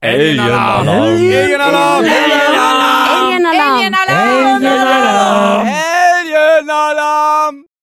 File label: alien alarm